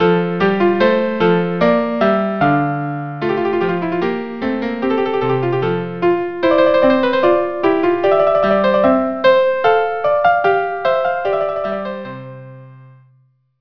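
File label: violin viola; ballo ariodante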